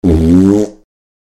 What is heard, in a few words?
wind strong fart; sandy sfx